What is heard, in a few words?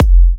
F, kicks; markus hakala kicks